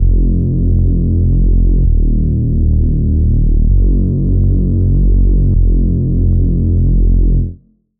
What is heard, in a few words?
Reese; Routed Sound; Spire Expansion - Noble Gangster Vol.1